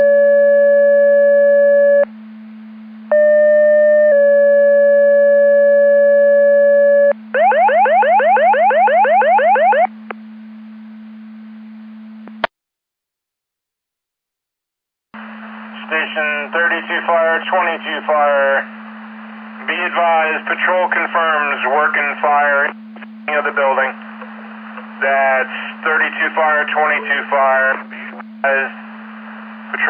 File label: Siren; Andover Twp Boro